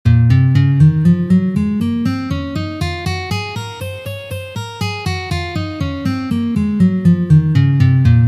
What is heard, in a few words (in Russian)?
Gamma Do Diez mazhor 1 pozitsiya